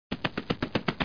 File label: muscle foot